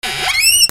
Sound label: SQUEAKS